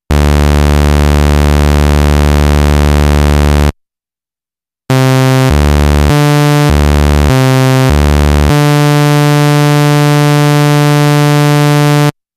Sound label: Nord Lead 3 Raw Saw Wave; Nord Demos; Nord Lead 2 versus 3